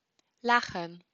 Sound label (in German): lachen